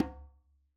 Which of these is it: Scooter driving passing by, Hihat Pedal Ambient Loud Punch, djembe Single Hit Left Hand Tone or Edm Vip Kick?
djembe Single Hit Left Hand Tone